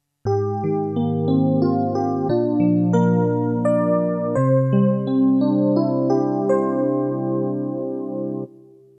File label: jingle